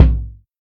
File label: rich low end steel kick drum sample a sharp key